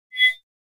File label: Mouse Over Short Elec